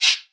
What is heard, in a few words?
short shaker sound a sharp key; percussion